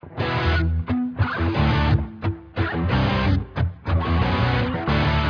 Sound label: power